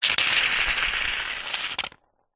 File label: household shaving foam squirt